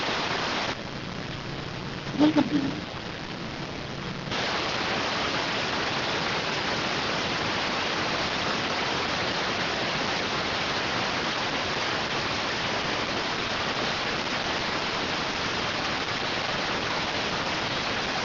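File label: radioteletype receive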